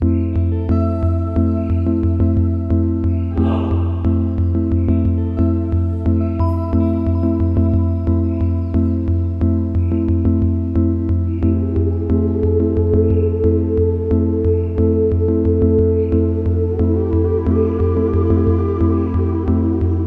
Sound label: CALM music